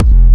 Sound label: Jump Kicks, Bassdrums